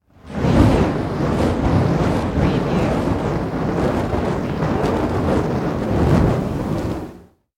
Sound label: Flame Movement Heavy; Sound Effects Bible